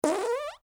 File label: fart